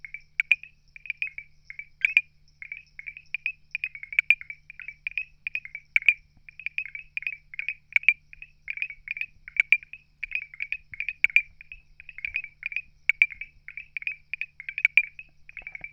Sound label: Boana pulchella, cantos; herpetologia